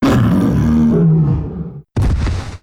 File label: CANNIBAL DIE